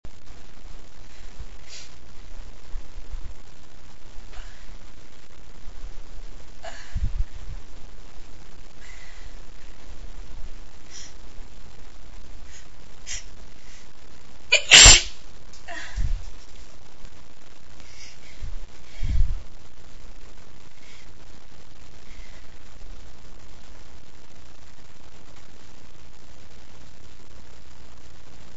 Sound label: Big with Buildups, female